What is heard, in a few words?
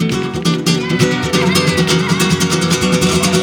GUIT GROOVE; Best Service; World Colours